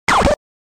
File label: Quick Throw; DJ sounds